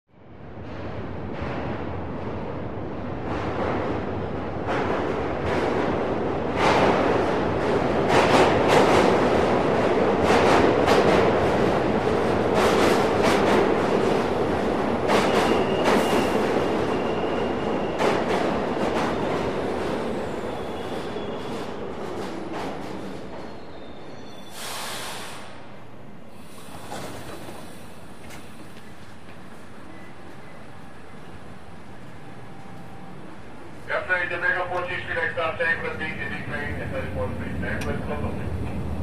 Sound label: Subway Platform Trai, subway